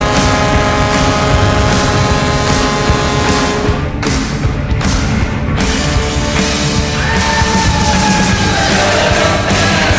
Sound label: goal horn; chicago blackhawks